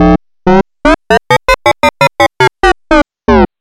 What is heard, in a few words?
Space Sound